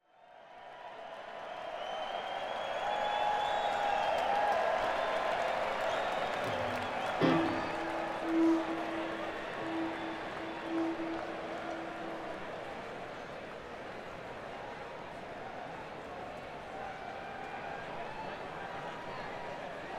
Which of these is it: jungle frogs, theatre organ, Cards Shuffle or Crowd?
Crowd